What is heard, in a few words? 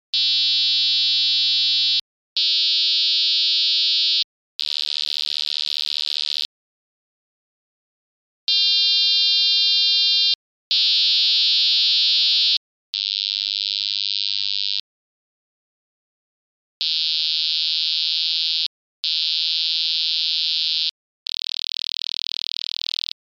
Tonebursts